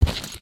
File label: soft, human step